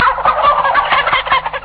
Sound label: turkey, birds; stamps; animals